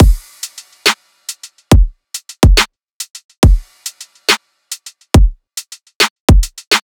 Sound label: Riddim Drum Loop 140 Full